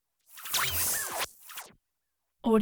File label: squiggle beam pass bys; prosoundfx